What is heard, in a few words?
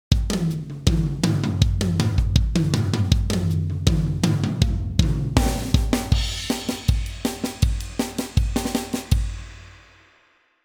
Drumsample